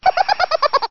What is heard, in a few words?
hihi